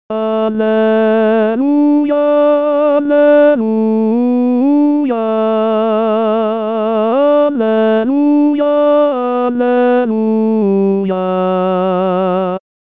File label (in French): Alleluia, Chorale; Boniface; Noel